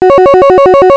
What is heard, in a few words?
Warble